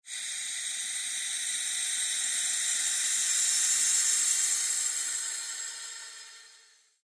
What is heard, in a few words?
Created Pulse Reverb, various sweeps, pulses and drones; Rises and Dives; Sound Design